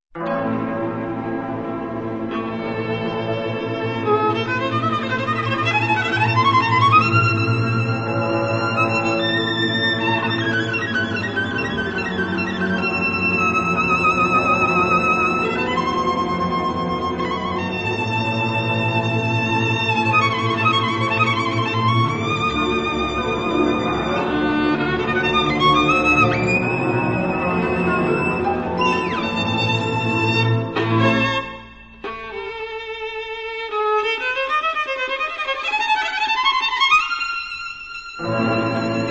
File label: Gypsy Violin; World Sounds